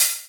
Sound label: Open Hhat, HiHat; Equipped Music; Loungin House